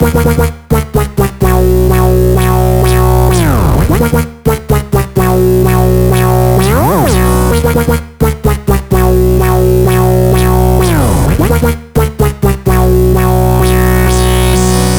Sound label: 128BPM Wobble House Melody